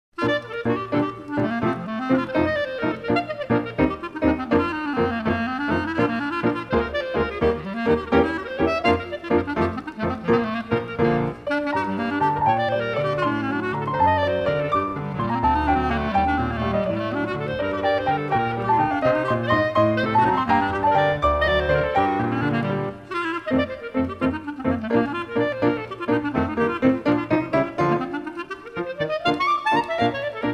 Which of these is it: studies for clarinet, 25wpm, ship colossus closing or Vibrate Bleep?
studies for clarinet